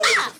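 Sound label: FEMALE RAVE; Spectrasonics; Heart of Africa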